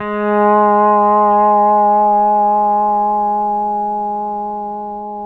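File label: pedal steel swell G#3; PEDSTL SWELL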